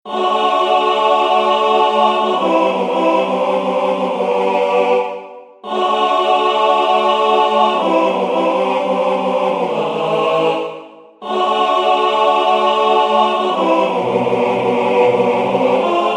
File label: MIDI, Intro orig Key Mens Choir